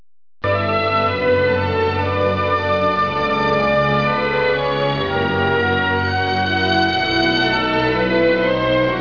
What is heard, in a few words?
romantic